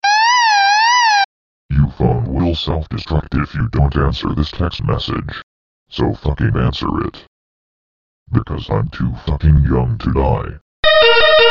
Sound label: Self Destruct